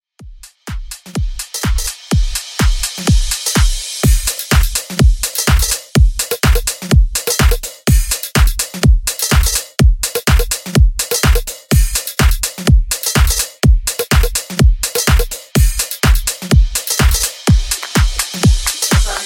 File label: Extended House 125 Bpm